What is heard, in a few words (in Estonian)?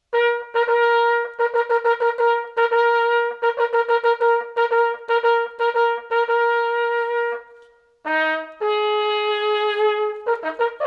Trompet